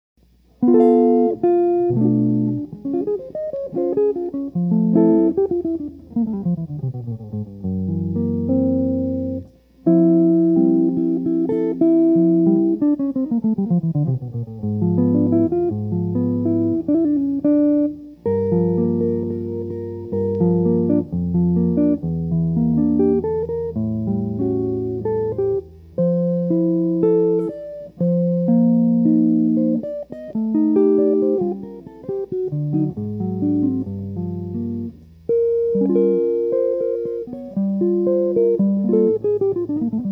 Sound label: Solo Guitar; Ceremony; Simple and elegant music for your walk down the aisle.